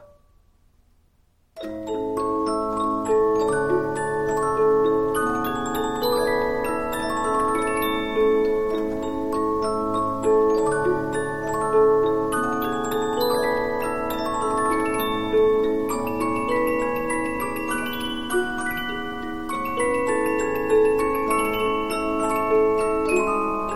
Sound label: Polyphon, 15inch disc musicbox